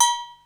BELL, DRUMS; SoundFactory; SMPMANIA

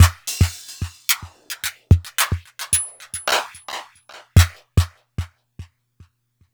Electronica Drumloop; Drums

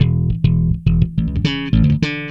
Electric Bass; Basses

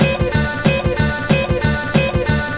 Old School Break, Drums

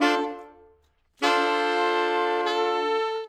Horns Section

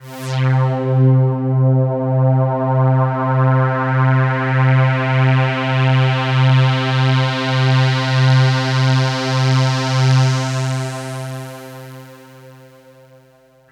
Pad, Synthesizers

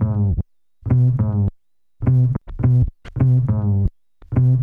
Acoustic Bass, Loops; ACID; Basses

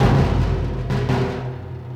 Acoustic Percussion

